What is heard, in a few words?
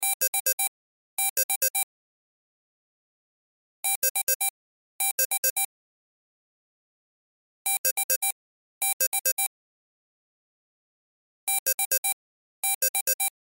Cell Ringtone; Loop; Cell Phones; Blastwave